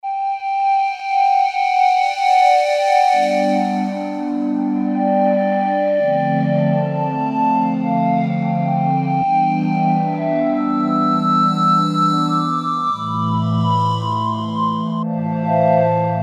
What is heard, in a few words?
Steam Synth, Roland W-30; Factory Performance Patches; Factory B